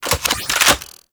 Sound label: Hook Sword Unequip; Archwing; Melee